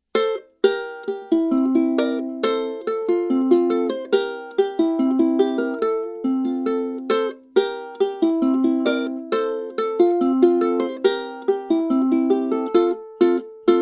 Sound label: Swiss Polka, ukulele